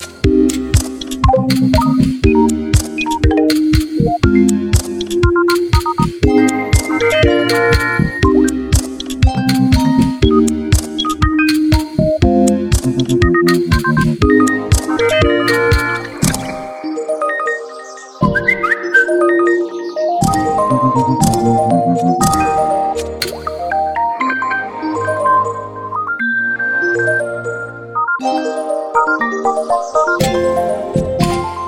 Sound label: Samsung Galaxy M14 4G Latest Ringtone